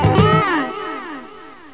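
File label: PING PONG, BOUNCE; Easy Personal Web Page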